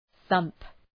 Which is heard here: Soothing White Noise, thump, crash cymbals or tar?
thump